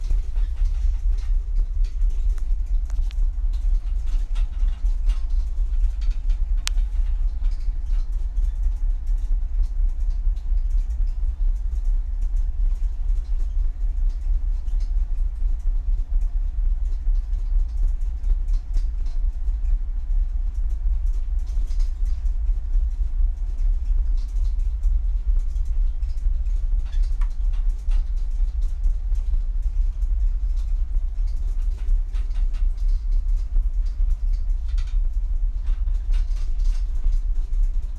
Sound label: Cabin Rattling